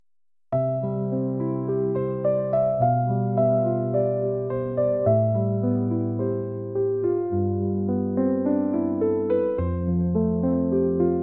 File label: Piano Eternal Love Harmony